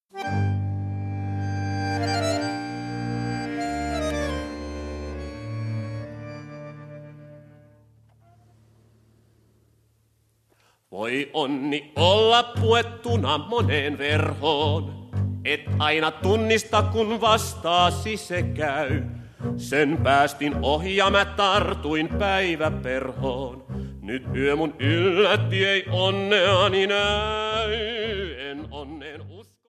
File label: tango